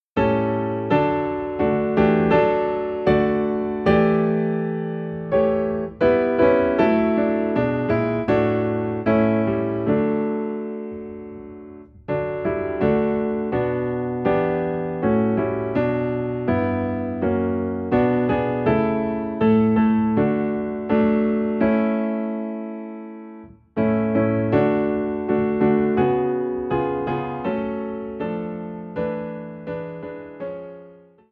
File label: Piano in D; christmas; Thou Didst Leave Thy Throne